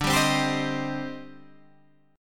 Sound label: C Minor D; Cm/D strummed; JGuitar